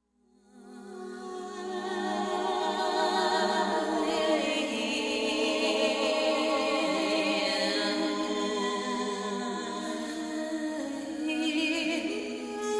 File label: vox loop